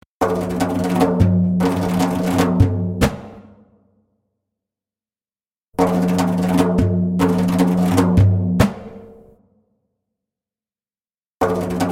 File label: Fill (fast) spoken, Djembolale; Fill; Djembolale - Fills and Drills